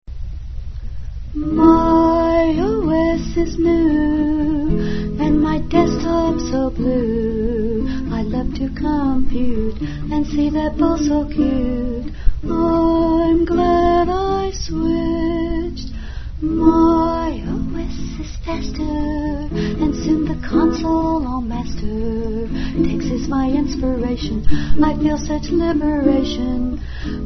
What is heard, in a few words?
tango; tux